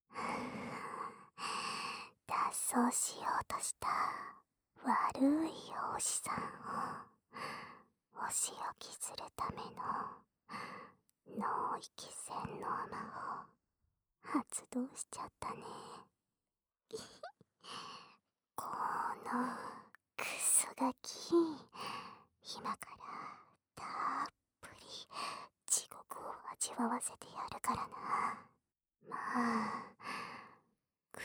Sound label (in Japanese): 耳舐め洗脳音声; 効果音無し